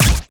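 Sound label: Laser gun Single; Lasrgun Loki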